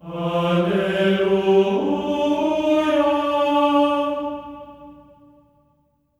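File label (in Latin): alleluia G4; Spectrasonics; Symphony of Voices; GR ALLELU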